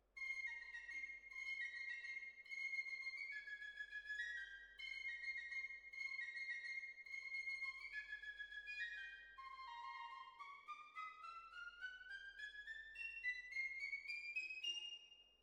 Hall, Jazz for piano

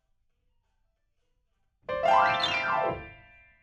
Piano, RNR for piano

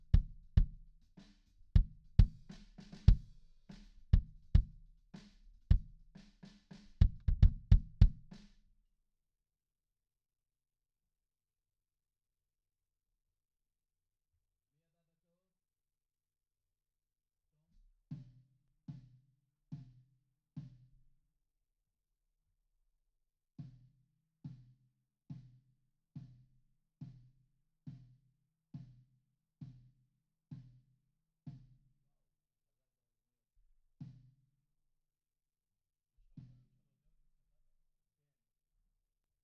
BD IN

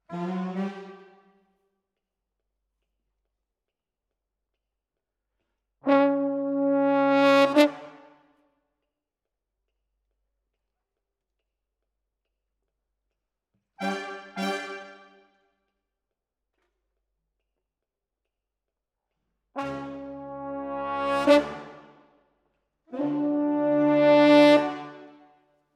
Horns

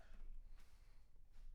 Bass Room

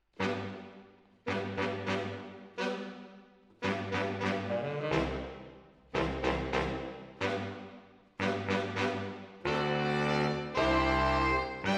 MKH AB, piano

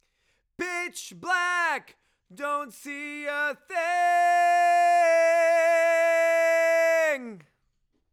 Chorus

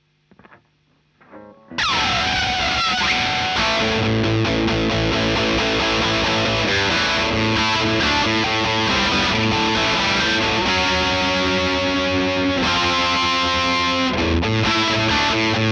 Gtr; Marshal

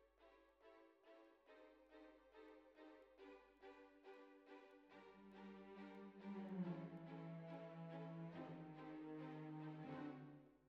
Bassoon, Jazz for piano

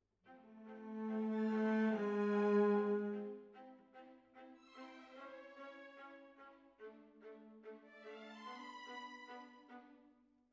Cellos

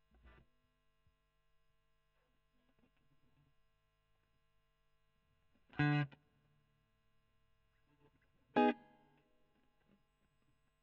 E.GTR; Gefell

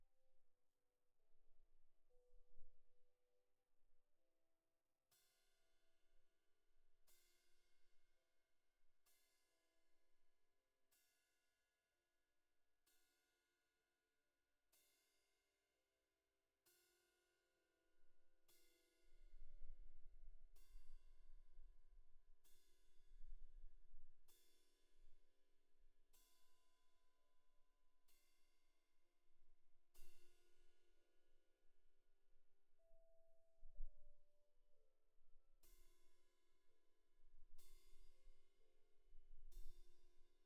Cymb2 Main

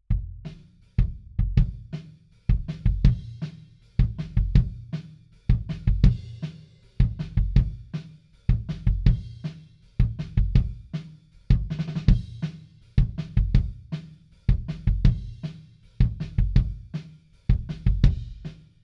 Kick In, 160 BPM

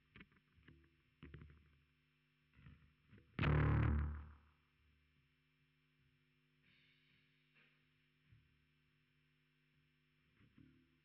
Bass Sansamp

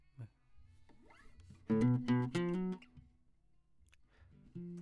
Classic Guitar

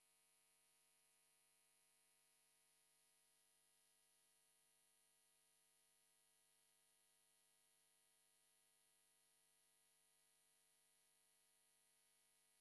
VOC GUIDE

